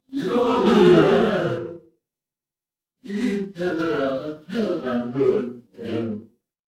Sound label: crowd gasp